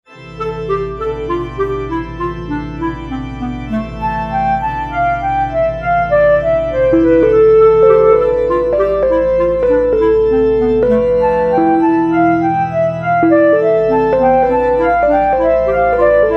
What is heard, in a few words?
the angel gabriel woodwind parts